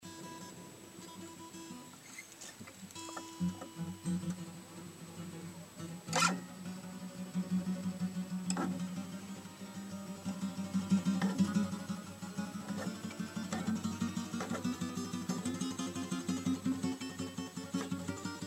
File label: guitar; crimea